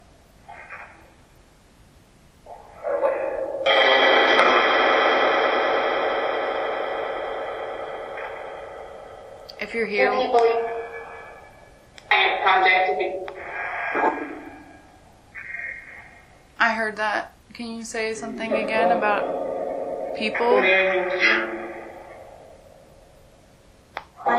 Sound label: Phasma Box; Canopy Room